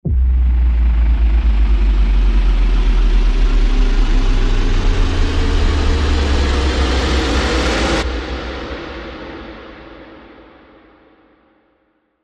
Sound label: Running Softly Flutter Rise